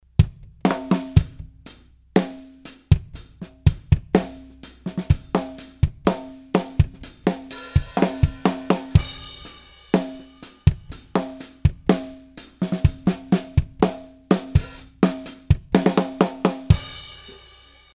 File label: Whole kit aligned from snare